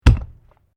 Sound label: Weights Dumbell Put Down; Gym weight lifting equipment; Sound Effects:Sports